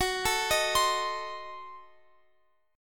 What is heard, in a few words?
Gb Diminished 7th Guitar Open F; Gbdim7; arpeggiated; x,x,18,16,18,19